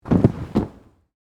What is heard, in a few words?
Body Fall Pad, Gymnastics; Sports; BlastWave